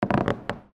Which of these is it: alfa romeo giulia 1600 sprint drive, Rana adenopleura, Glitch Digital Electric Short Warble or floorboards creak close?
floorboards creak close